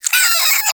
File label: Vocal Pain, Critters; Characters; Warframe; Cryptilex; Hunting Critters